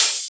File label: Open Hihats, Cymbals